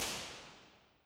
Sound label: cave; reverb; mono